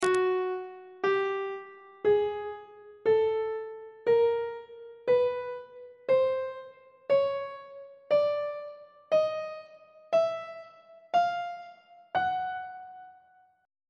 g flat aeolian mode chromatic scale 1 octave on treble clef